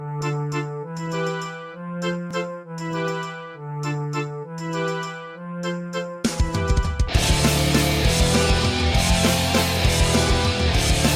Metal

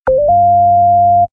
Going Higher Sms

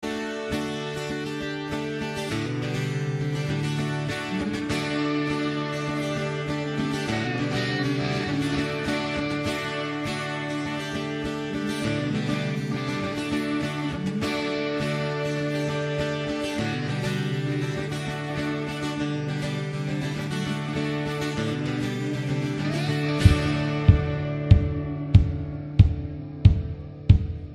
Southern Guitar

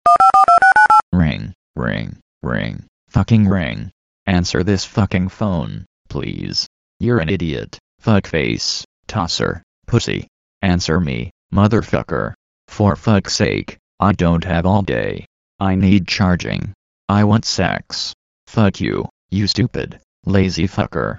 Moany Phone

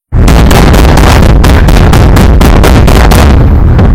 FBI BREAK DOOR

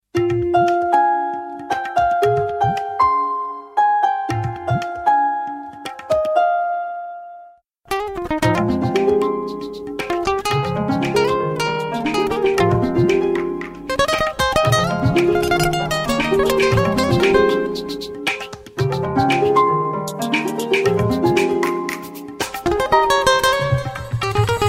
Relaxzing Tone

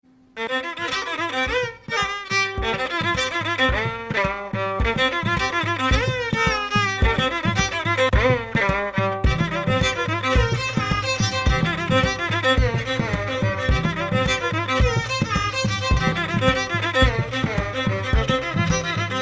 Violin

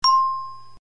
Ding; TPIR

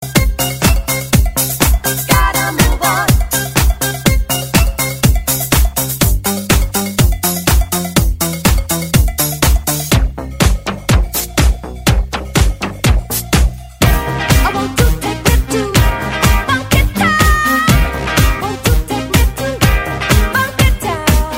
RETRO MIX DISCO

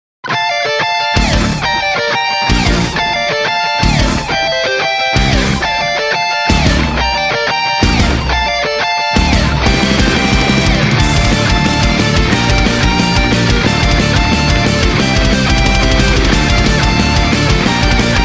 Metal; Punch Out

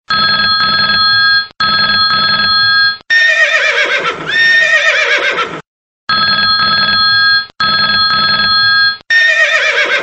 Horse Call

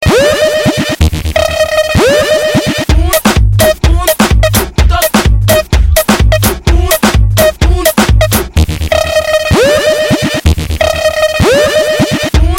Club Tribal Alarm

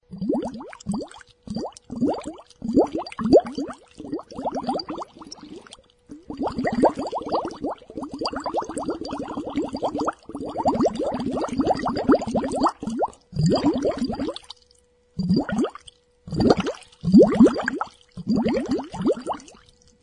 Water Drops

